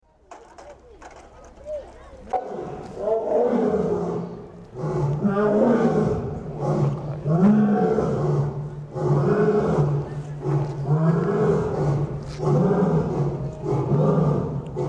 ciudad de Mendoza parque zoologico de Mendoza

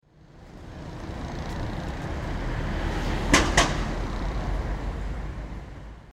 calles cordoba y pocho lepratti boca tormenta

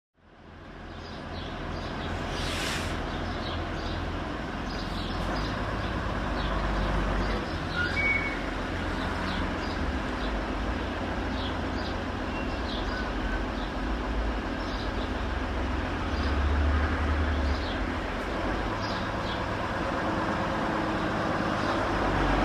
calles parada; san nicolas y santa fe; Rosario

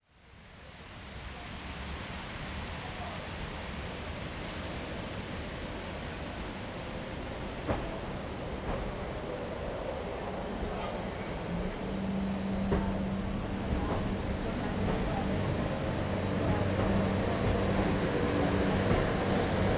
estacion, olivos; bs as; bartolome mitre

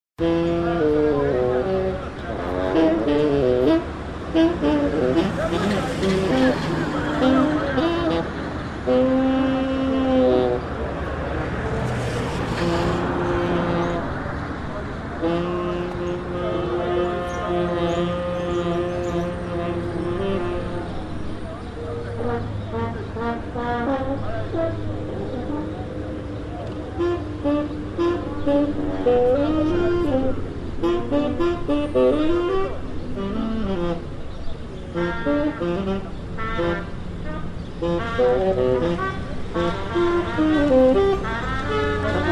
manifestaciones march; millon marijuana; bv orono y el rio

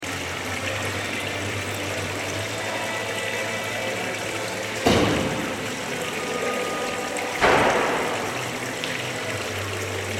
bares cafeteria

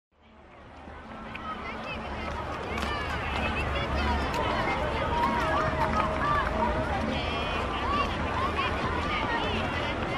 manifestaciones acampe plaza; plaza 25 mayo; Rosario

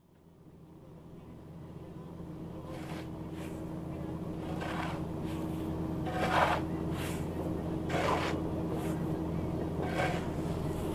salinas grandes extraccion sal; jujuy

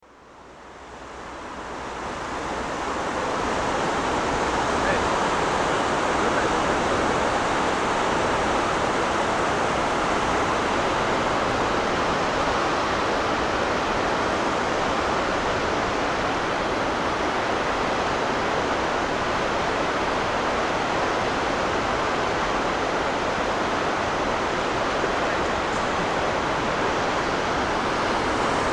Uspallata rio Mendoza

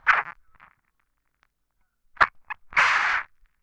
toma mate; Oído Absoluto

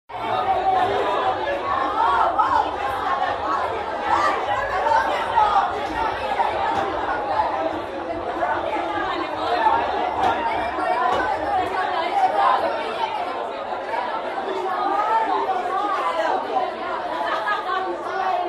bares drugstore; mendoza y cafferata

